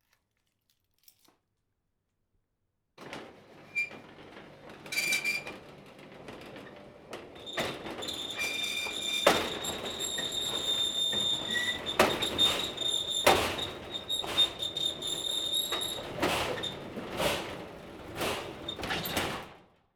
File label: Metalic door; Radio Campus Montpellier